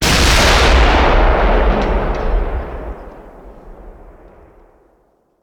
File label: Artillery Cannon Artillery; Sound Ideas; Guns